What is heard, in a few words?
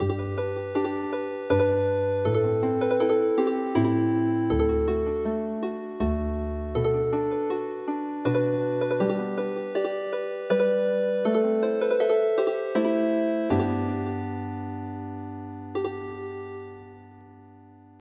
bounce ball song Healing Harp